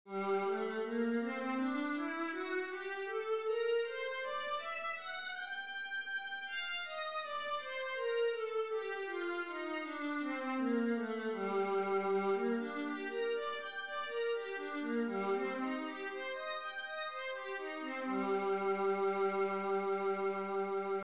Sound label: viola g minor, scales